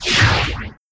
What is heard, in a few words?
heatray fire, weapon